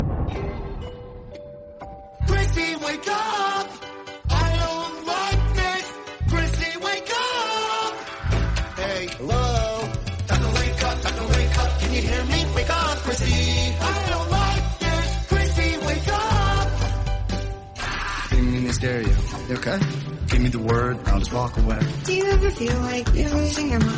alarm english; chrissy wake up; stranger things

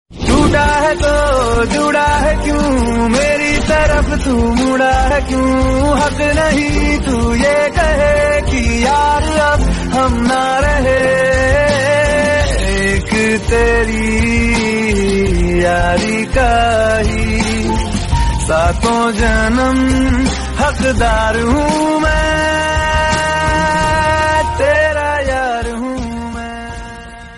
romantic hindi

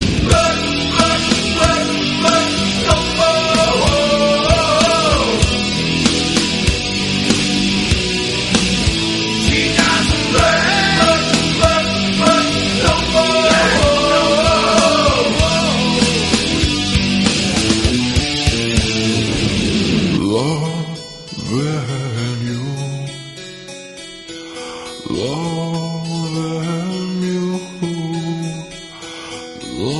english song

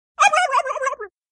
turkey gobble sound effect notification

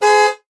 car horn vehicle

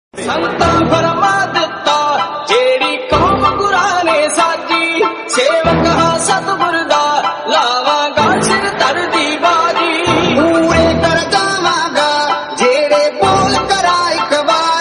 gurbani punjabi

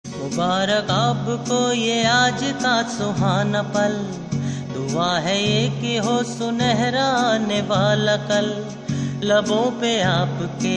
wishing song, hindi

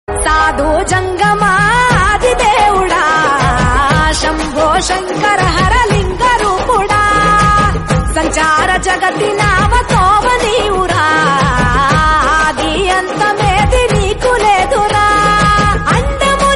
devotional telugu song

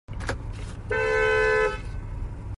bicycle horn sound notification

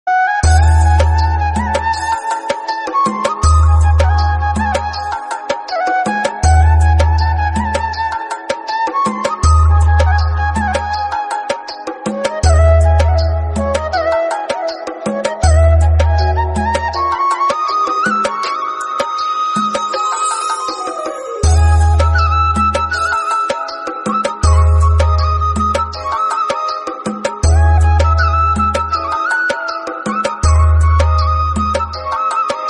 heart touching flute instrumental

